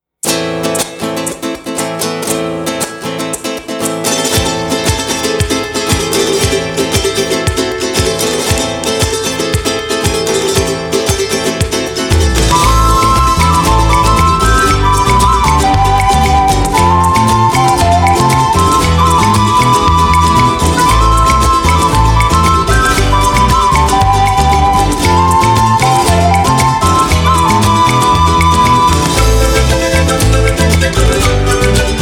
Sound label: rumba